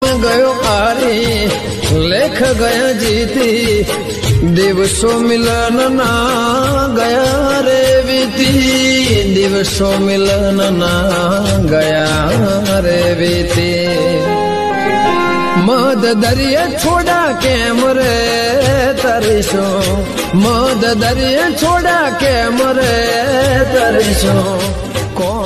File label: gujarati ringtone